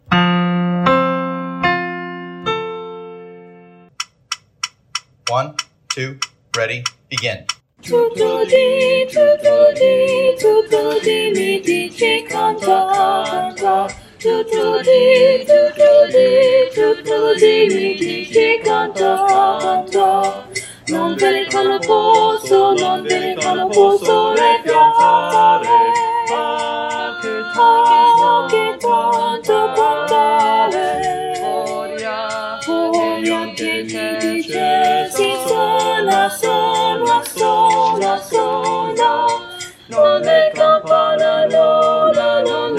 tutto lo di soprano dominant, choir

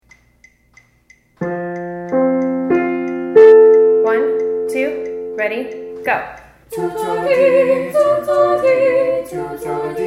tutto lo di tenor, choirs

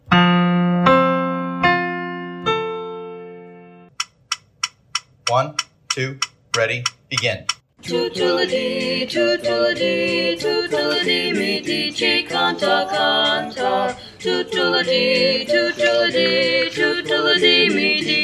tutto lo di alto, choirs

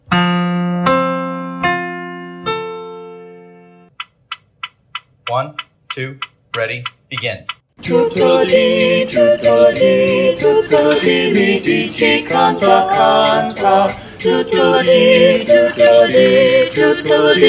balanced all voices

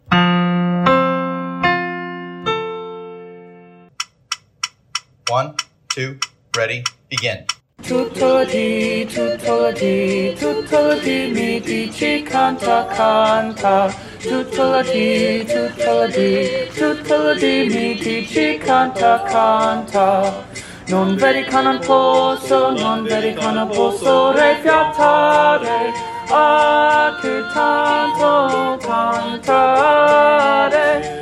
tutto lo di tenor dominant, choir

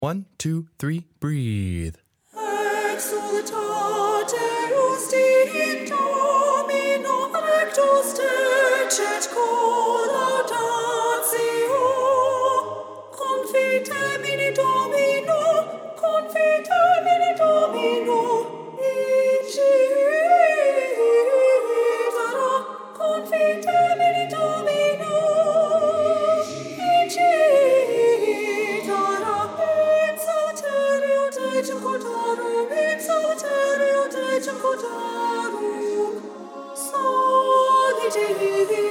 exultate justi in domino soprano

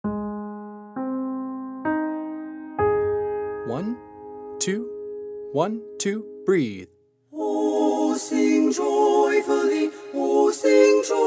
o sing joyfully bass mute, choir

